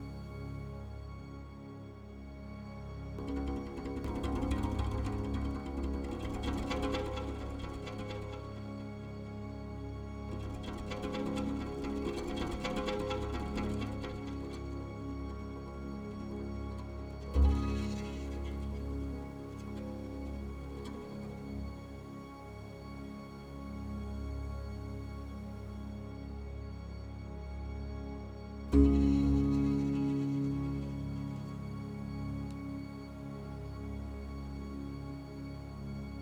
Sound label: Music Menu